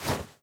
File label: sprint rustle